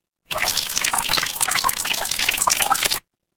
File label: water stream